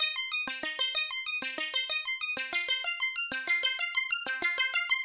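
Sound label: plucky simple sequence, 95 bpm; SYNTH AND ELECTRONIC LOOPS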